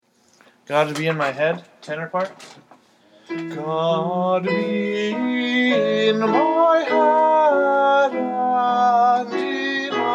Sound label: tenor; god be in my head